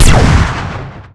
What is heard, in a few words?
gun; StarSiege